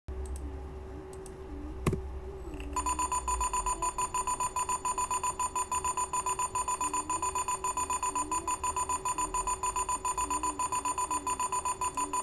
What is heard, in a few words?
1 khz